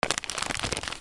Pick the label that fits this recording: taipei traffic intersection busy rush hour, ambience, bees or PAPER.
PAPER